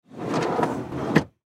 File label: Plane Beechcraft, Plane Beechcraft King Air 200 Interior Cockpit Door Open; Transportation Aircraft; Airplane